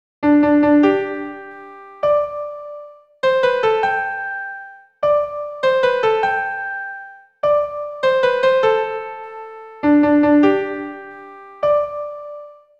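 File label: r.h. only - 100 bpm, piano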